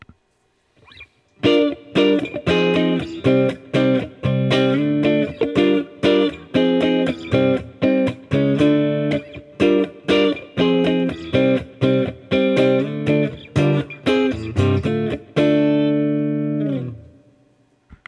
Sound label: Guild NS Bluesbird Both Tiny Tweed, guitar; 2016 guild newark street bluesbird guitar